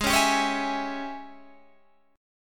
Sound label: G sharp Major 7th Suspended 4th Sharp 5th G sharp, down; strummed; chord